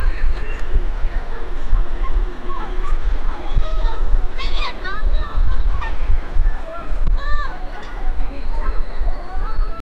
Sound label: casual game town ambience